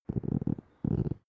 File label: dog small growl very soft short